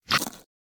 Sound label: bite; perdachcraft; minecraft